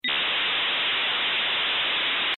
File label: internet sound